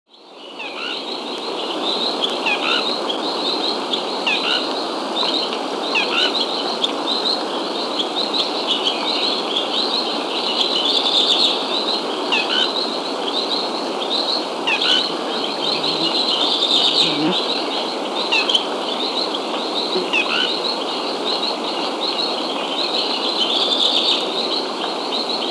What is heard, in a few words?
Cerulean-Capped Manakin (Pipra Coeruleocapilla), Andean Birds; S. America